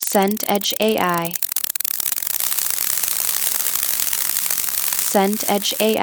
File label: noise pink